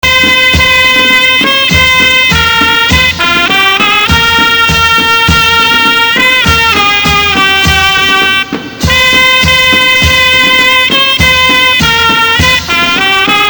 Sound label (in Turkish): mehter fetih marsi; osmanli mehter marslari zil sesi